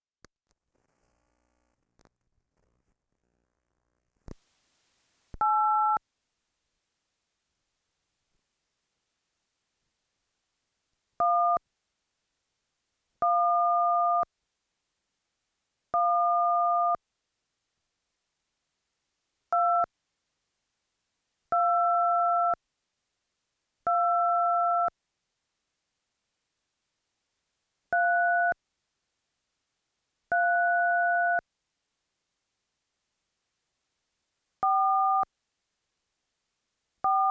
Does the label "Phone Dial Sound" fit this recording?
Yes